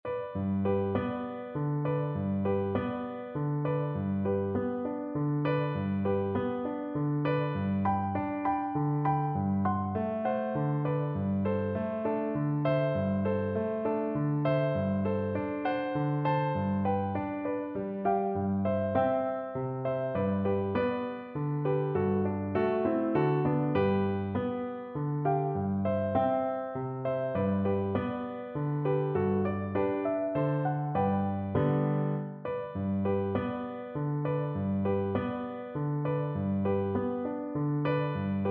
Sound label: lullaby, Recorder